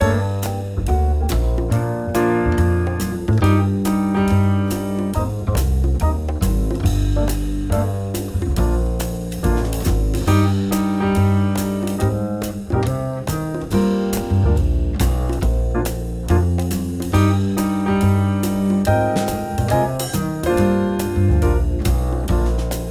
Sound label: Smooth Jazz Combo Soft Lounge Music